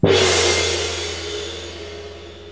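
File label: gong single open short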